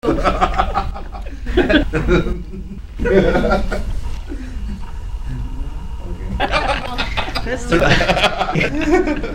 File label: laughs